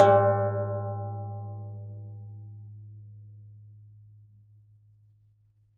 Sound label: low bell; Univers Sons - Raricussions